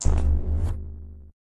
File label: Ship Magnet On; lethal company